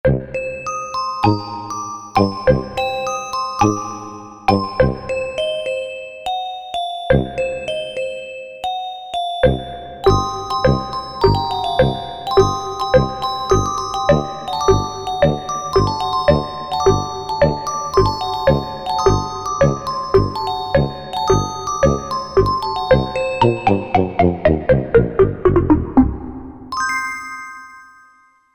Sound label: Blocken, Korg MSC-1S; Korg MSC-1S Variety